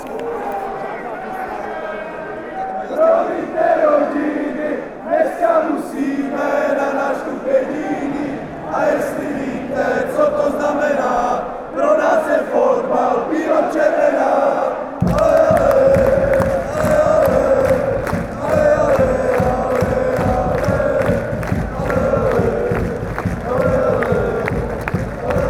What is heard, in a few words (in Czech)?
prominte zeny, fan chants